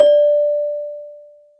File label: CELESTA D5; AKAI S-Series CD-ROM Sound Library VOL-2